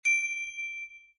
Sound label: Cake Upgrade